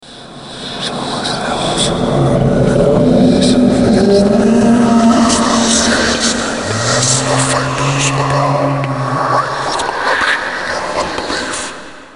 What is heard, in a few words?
zombie idle